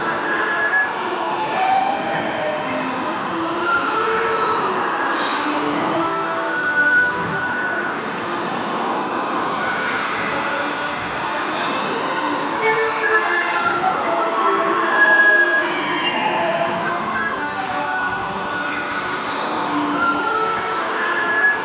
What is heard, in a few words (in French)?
je souhaite une ambiance, park